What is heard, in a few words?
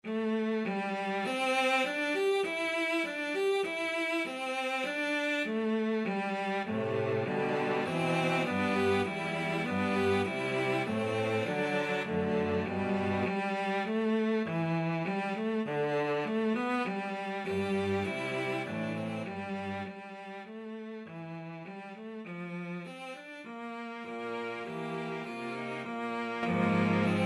trio, cello trio; musorgsky